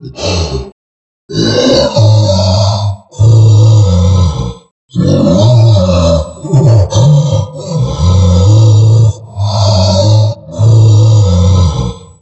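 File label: Boss Appearance Yeti; Super Monkey Ball Banana Blitz HD; Boss Toujou Yeti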